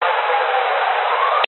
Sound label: technology cb radio burst static city background siren